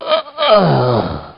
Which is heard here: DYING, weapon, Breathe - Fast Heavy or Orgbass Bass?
DYING